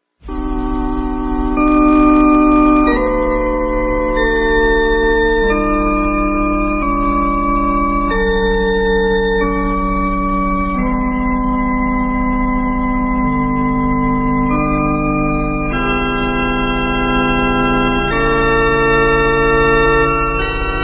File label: Loves Whistle; Free Sound Orchestra